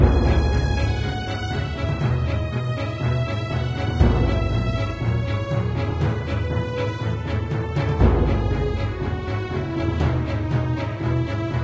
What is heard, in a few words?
Intense; Valthirian Arc Hero School Story